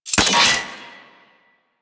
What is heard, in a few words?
Sniper Rifle Alt Mech; Warframe; Weapons; Corpus